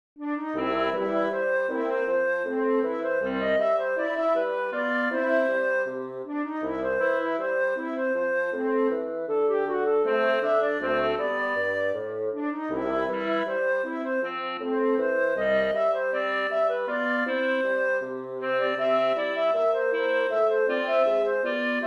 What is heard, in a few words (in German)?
entertainer Blaeserquintett